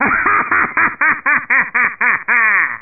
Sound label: cackle, spooky sounds